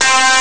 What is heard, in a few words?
fuzz guitar; ST-XX Amiga Soundtracker Sample Packs